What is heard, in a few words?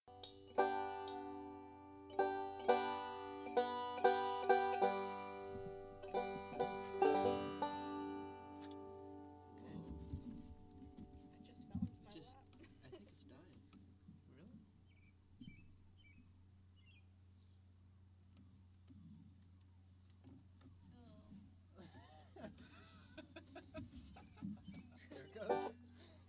banjo music; cvillepublicmedia